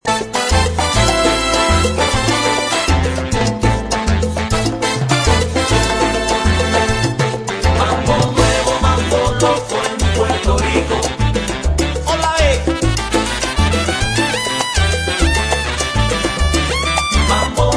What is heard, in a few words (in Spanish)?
Mambo nuevo